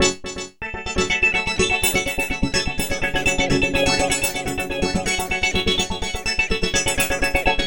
synth; Cubase Magazine 46; Evolution 14

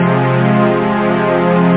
low, synth chords; OctaMED SoundStudio V1

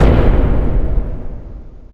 drums giant

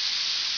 arrow fly; Hexen2; assassin

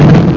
enemy fire; fx

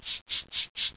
four shak, music

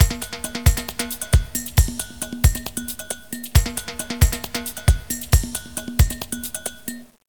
SAMBA; drums

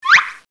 Slip; Princess and the Pea; SoftKey

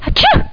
SNEEZE; Battle of the Superstars

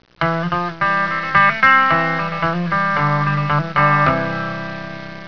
music

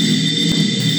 ion burst; axis

